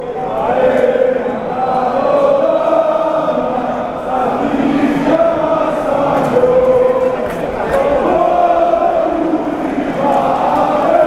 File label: chant, fanchants, greece; panathinaikos